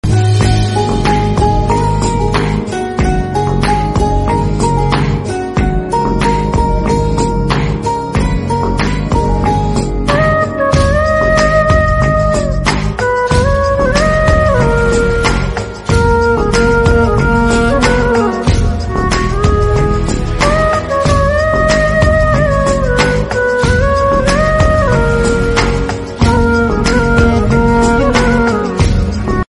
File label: Instrumental